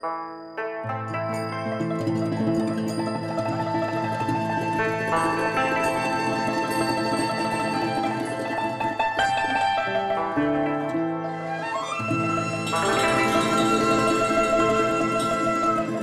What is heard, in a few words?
TRADITIONAL Chinese, Picking Flowers